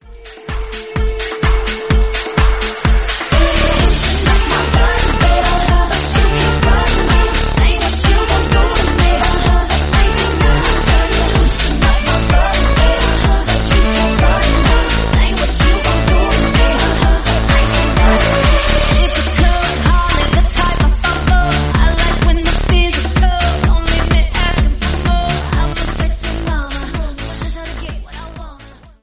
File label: Club, 127 bpm D; Redrum; remixplanet